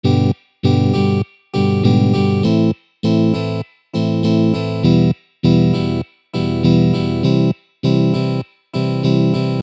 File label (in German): Schlagmuster; pfadfinden